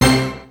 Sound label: ORCH HIT, Peavey DPM; Rubber Chicken Software Sample Bank